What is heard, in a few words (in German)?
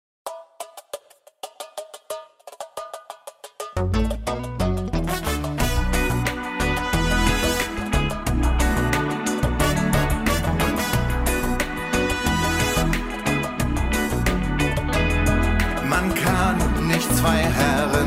Übefile Tenor; Neben Gott; 1. Gebot